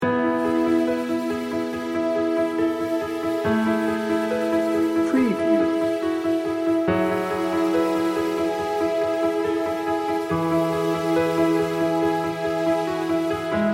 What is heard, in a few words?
teamwork loop